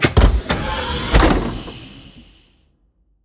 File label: close; ships